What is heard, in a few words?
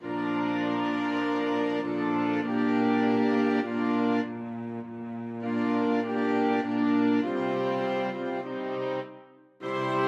orchestra